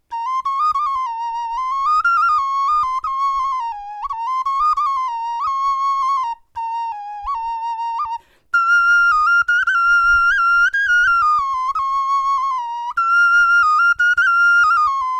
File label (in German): melodie, knochenfloeten